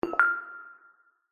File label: multimedia game sound chime mallet warm family game